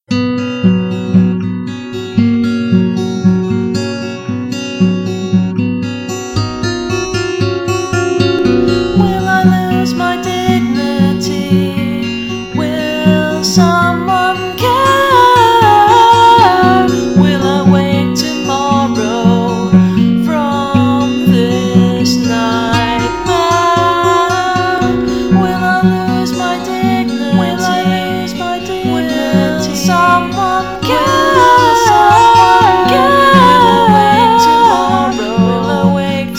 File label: with vox